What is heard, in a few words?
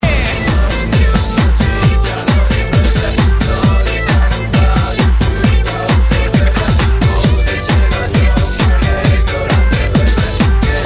Techno Remix Non Stop